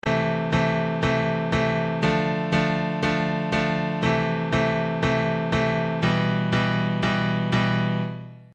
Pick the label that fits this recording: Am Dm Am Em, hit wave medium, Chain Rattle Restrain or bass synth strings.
Am Dm Am Em